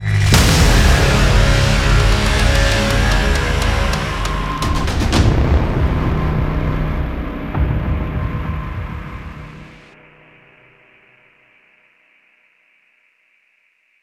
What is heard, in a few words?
Mission Failed; jets n guns 2; ps4 ps5 switch windows